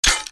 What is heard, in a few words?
attack pre launch; Li Li Shadowpaw Sound Effects; Li Li Heroes of the Storm Responses; warcraft